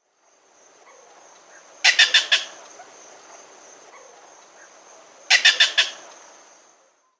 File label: Little Bittern alarm call